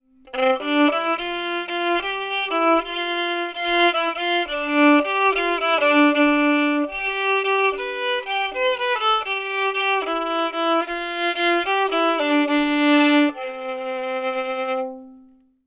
violon air

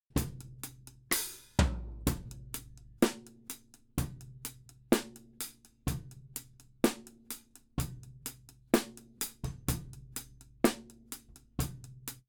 mixage cymbales seules sans effet

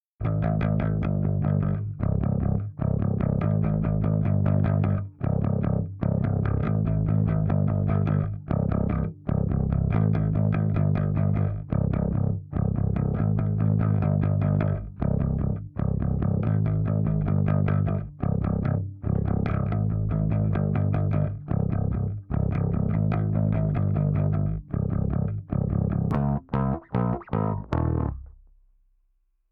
bass avec effet; novatron

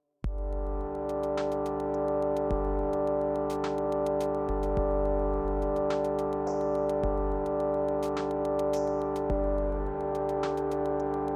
pad sans effet

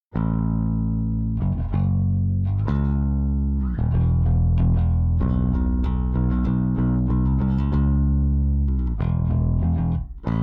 bass avec effet; stoneq 4k

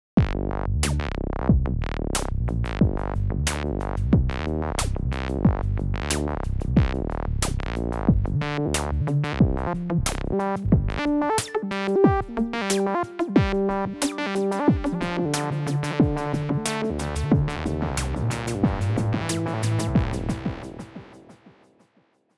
synth loop sans; omnitec

